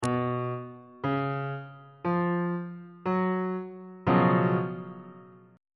f sharp major 7th suspended 4th 1st inversion on bass clef